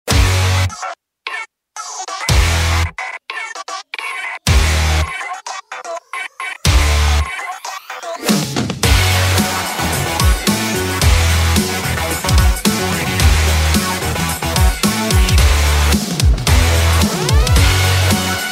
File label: Breakbeat Rock